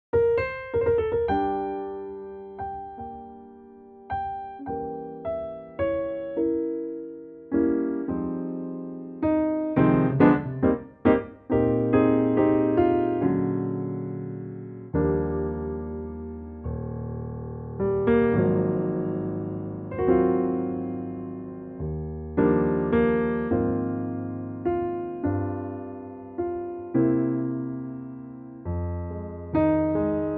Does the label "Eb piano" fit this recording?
Yes